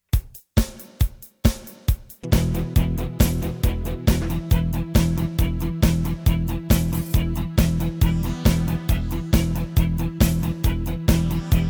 Alternative Rock

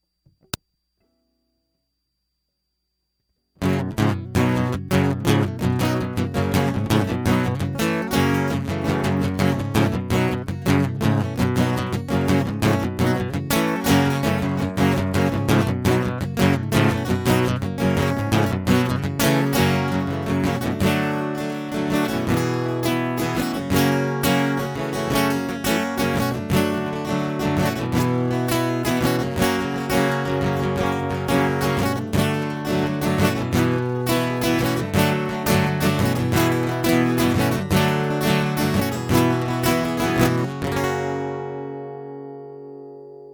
Guitar Prelude; hyper terrestrial

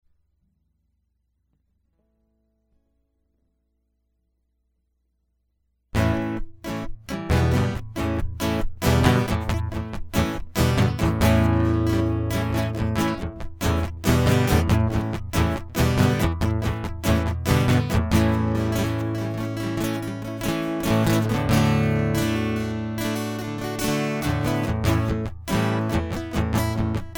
Guitar Loop

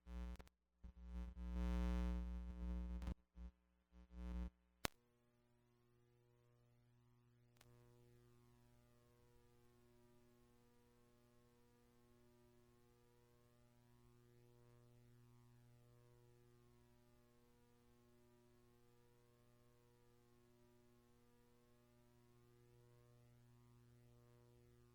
Piano Musing Am F G Am; hyper terrestrial; Incubated

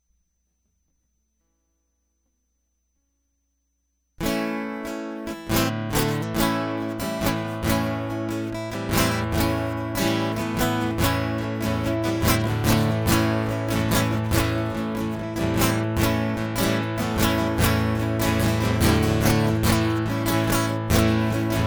Guitar Loop

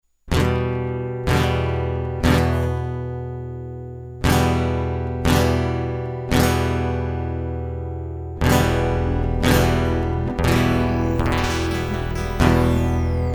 Instrumental

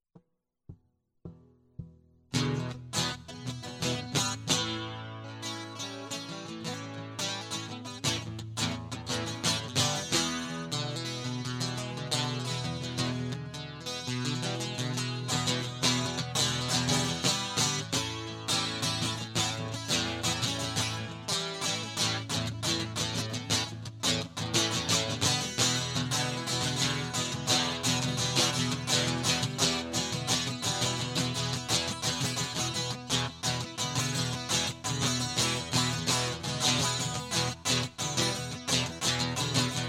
guitar; hyper terrestrial

